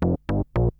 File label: Synth hits and riffs